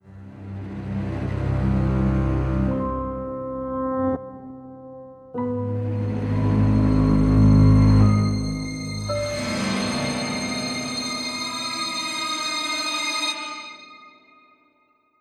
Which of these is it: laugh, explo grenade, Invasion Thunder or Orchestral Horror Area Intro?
Orchestral Horror Area Intro